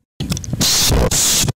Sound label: Loud SUS sound; Among Us